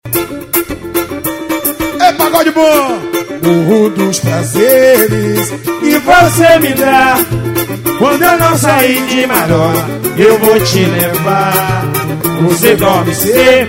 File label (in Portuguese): samba